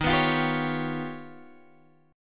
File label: D sharp Augmented 9th D sharp Guitar Open G